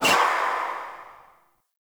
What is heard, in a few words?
Buff Indicator; Focus